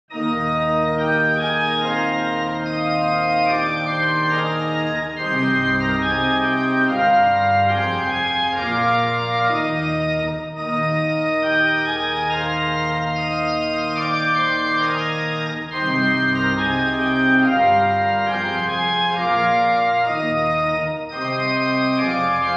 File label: Short Chorale Preludes; Three Short Chorale Preludes 3 Sing Praise to God